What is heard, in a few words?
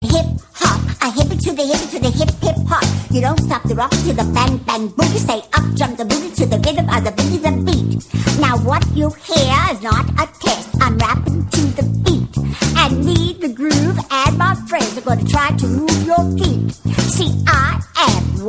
grandma rap ringtone